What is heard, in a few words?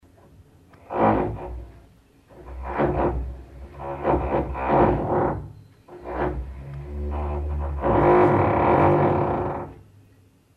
drilling; fieldrecs